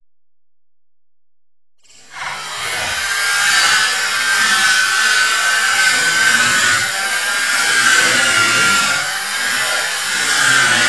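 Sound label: sharpen traditional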